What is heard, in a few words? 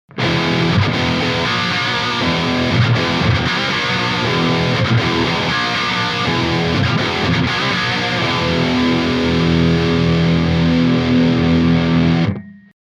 jcm800 tubescreamer, gtar